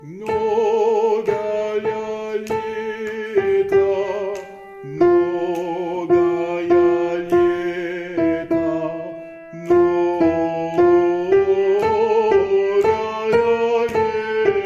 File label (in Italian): Soprani; MNOGAJA LJETA